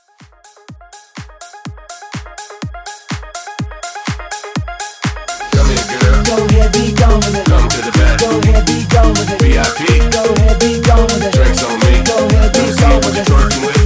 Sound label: Club House Sexy Edit 124 CLEAN